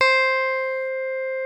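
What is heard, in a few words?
DAMP GTR C5, mute guitar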